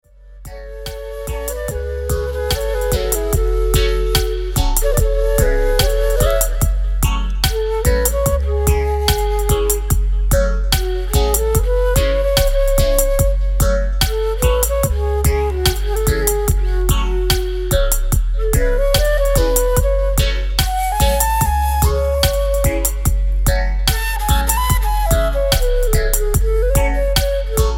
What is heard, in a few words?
Flute Style